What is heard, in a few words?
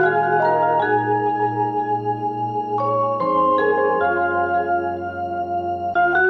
keys, jazz loops